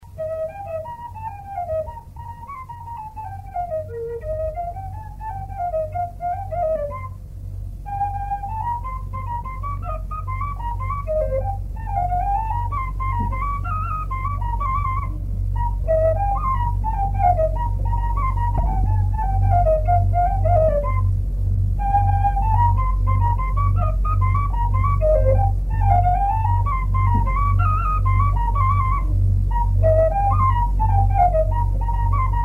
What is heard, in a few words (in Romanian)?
Tantarasul la fluier; jocuri si cantece batranesti; Vaslui